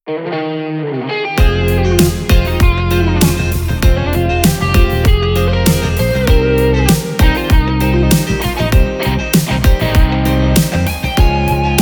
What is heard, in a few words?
Band Dire Guitar